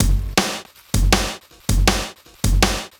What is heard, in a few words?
Beat, Futurist Drum & Bass; Drums & Percussion